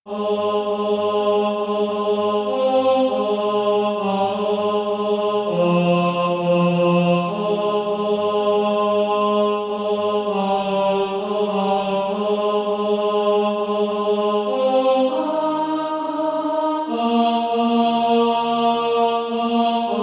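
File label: tenor, Practise Music; SATB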